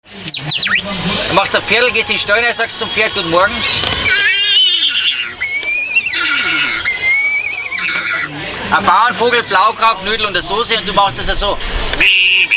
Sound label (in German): Voglpfeifer